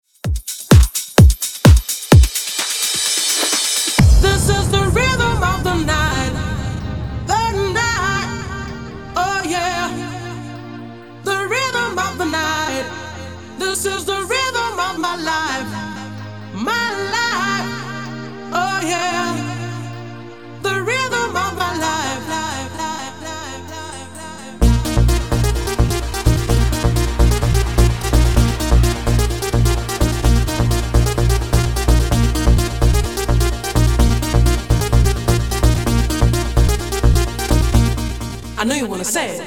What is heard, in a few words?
90s Redrum 128 Bpm